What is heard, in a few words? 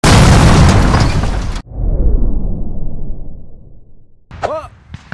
Collapsing; The Henry Stickmin Collection